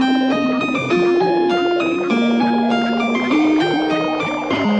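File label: bizarre classical loop, 100 bpm; SYNTH AND ELECTRONIC LOOPS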